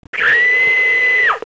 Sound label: high scream